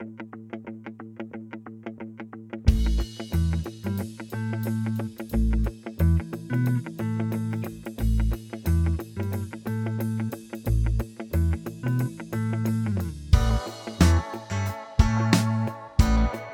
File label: Funky Surfer Tune